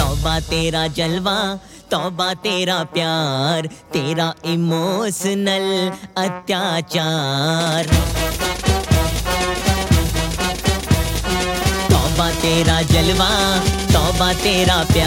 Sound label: Bollywood Hindi Ringtone Emotional